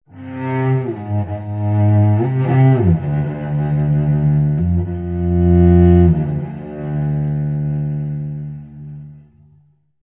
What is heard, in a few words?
Melodramatic Cello